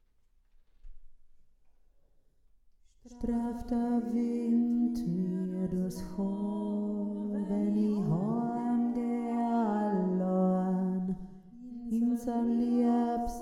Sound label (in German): deine h nd tiefe stimme